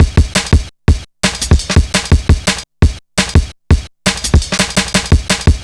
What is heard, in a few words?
170, drum loops, drum & bass; 90 s Sample CDs; Total Drum&Bass